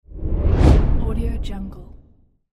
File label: whoosh